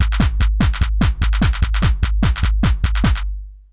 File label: Lo-Fi, Essential beats; FM 57 - The Ultimate Sample Collection (1997)